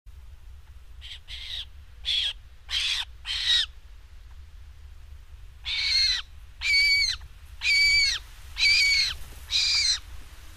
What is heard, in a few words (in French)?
busard cendre